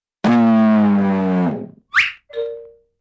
dog digs hole and drops bone into it

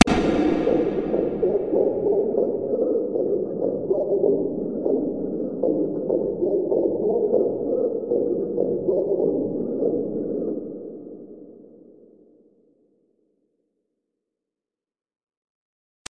water toilet

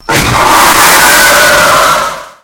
screamer

